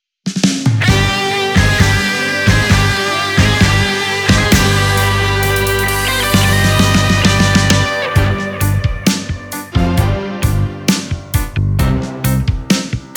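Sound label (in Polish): Tango na głos orkiestrę i jeszcze jeden głos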